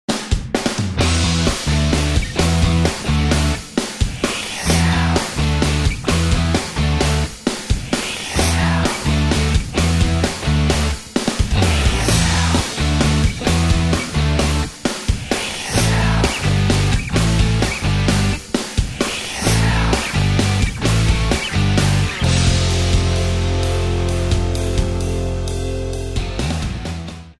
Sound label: Hey You - 130BPM, rock